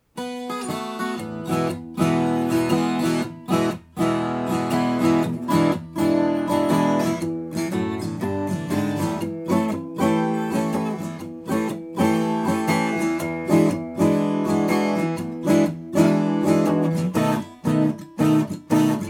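Phoenix Audio Ascent One Taylor 12 string MONO ORTF AB XY Single Mono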